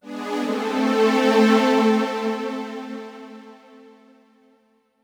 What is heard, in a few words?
DEEPHOUSE PAD 125 A; Keys